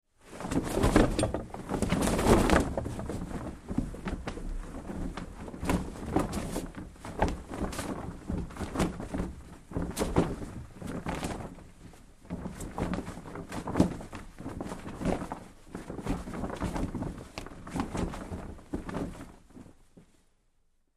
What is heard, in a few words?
Large Heavy Canvas Sail Flap In Wind Small